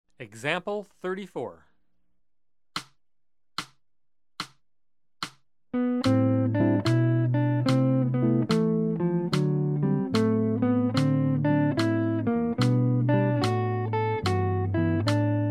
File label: Jazz; jue shi ji ta